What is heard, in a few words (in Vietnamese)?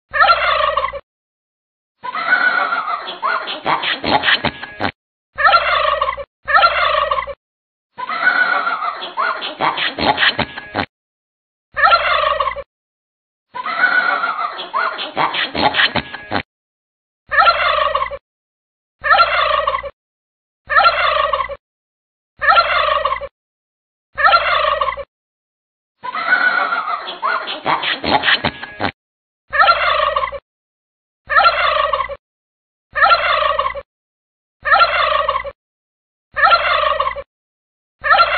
tieng ga tay keu